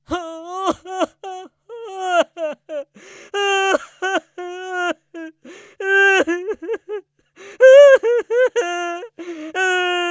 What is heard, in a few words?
male cry; emotions sound story